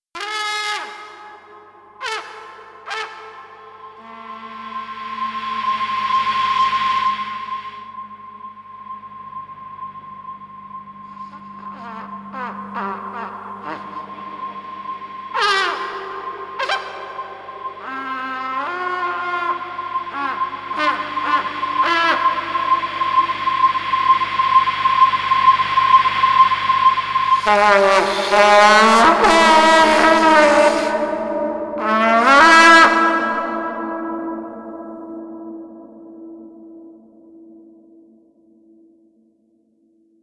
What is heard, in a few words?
Noise Improvisation